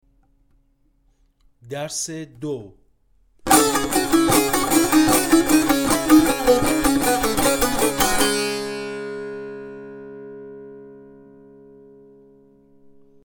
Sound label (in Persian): مشق تنبور; تنبور جلد دوم; هفتاد و دو مشق تنبور